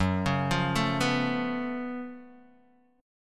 Gb Minor Major 11th Gb down Guitar DADGAD

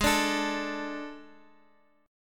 A Major 7th Suspended 4th Ab

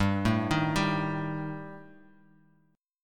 G-Suspended 2nd Sharp 5th-G; Gsus2#5 arpeggiated; JGuitar

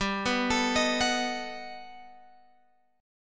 G Augmented Major 9th, Guitar, Open F; G+M9 arpeggiated; 19,18,21,22,21,x

